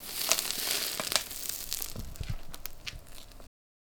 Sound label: Fire Staff, Fire sound effects